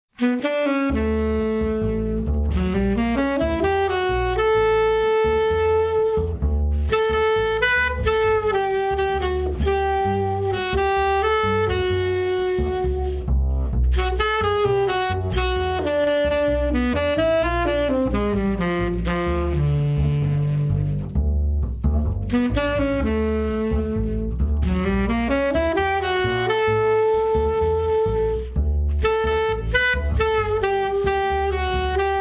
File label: unplugged Duo